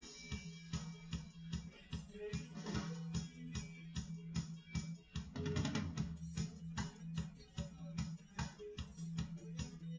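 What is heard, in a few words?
with drums, live rec; SiSEC11; underdetermined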